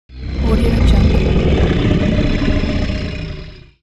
dinosaur growl

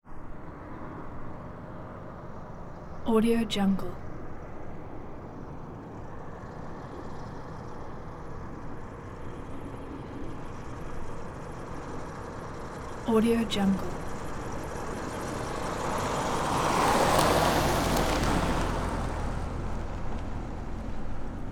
1975 cadillac el dorado car slow in; prosoundfx

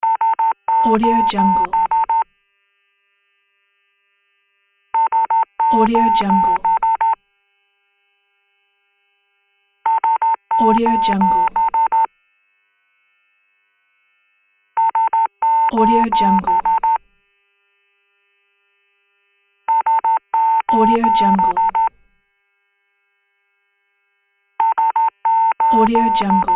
morse code sos radio; dudesound

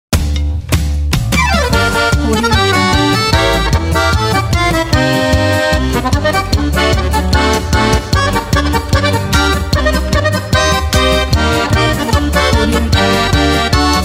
klezmer

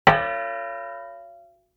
impact metal plate hit ring stopped soft

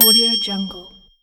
bell ding

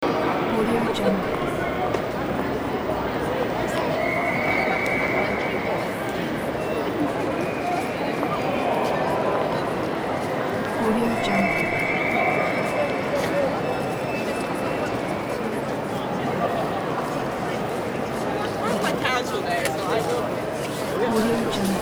ambience london waterloo train station

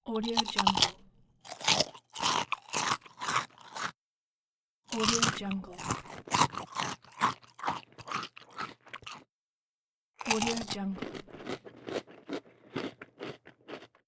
eating chips

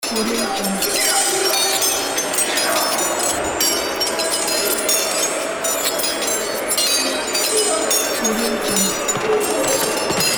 medieval battle

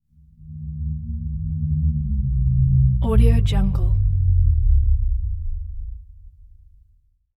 rumble moan

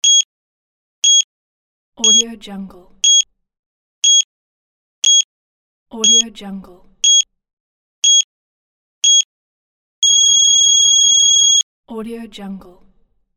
countdown beep tones

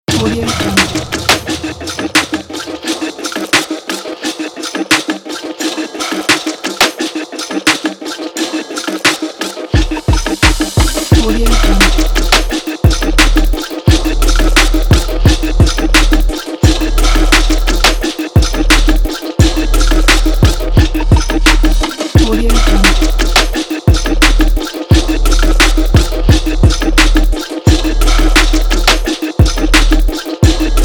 tribal hiphop trap

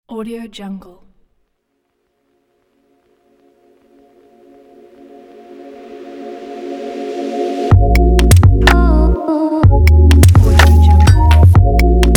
fashion beat; rarebeats

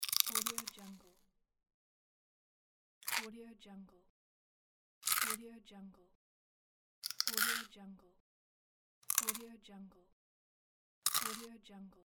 crunches

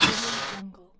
scifi inventory energy item

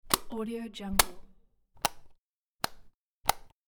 lights off

